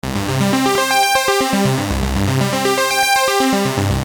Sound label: Arpeggio 120 C, Techno Synth Loops; 120bpm; Sonic Foundry (Sony Creative Software); Signals I